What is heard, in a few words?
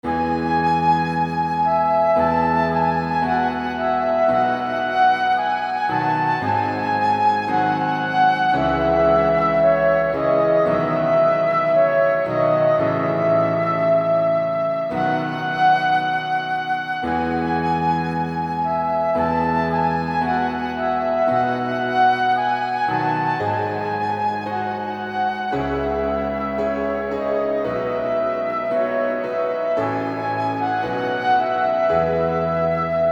piano